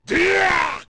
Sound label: Pound; Ganondorf; Zelda; OOT